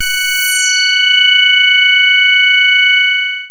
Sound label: MASS LEAD, SYNTH; Keith Emerson Signature Series